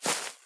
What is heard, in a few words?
grass Walk